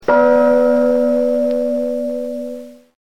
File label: BELL